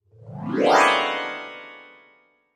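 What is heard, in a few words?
Music Transition Vibraphone; mallets vibraphone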